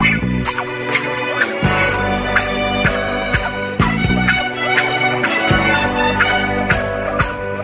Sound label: strings critical disco; Loops; Phonky